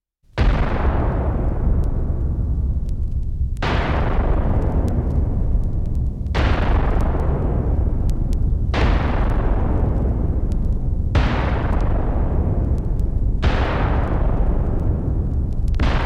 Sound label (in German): Science-Fiction Explodierende Antimaterie